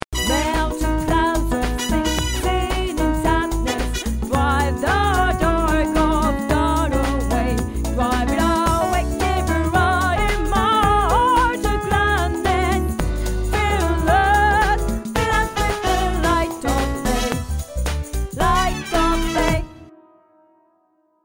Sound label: Joyful C Voice Piano Alt; christmas